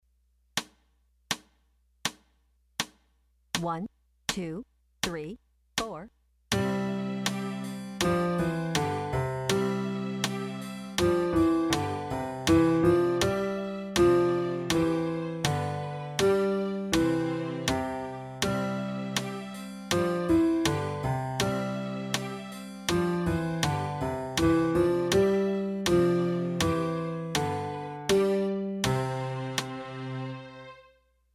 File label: Musette